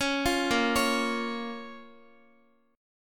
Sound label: A sharp Minor A sharp down Guitar; A#m; arpeggiated